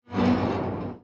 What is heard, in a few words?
recycler jaw move; Factorio